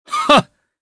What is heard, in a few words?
Attack, jp; Kain; kingsraid